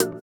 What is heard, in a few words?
VIBRA; Spectrasonics; Metamorphosis